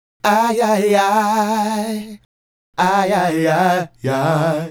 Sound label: Male Group, Hip-Hop and RnB Vocals